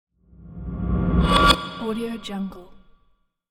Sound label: tension metallic reverse transition